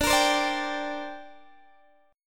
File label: D 7th Suspended 4th Sharp 5th, down, Guitar, Open D